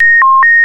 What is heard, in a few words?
Start, Signalling Tones Elevator Phone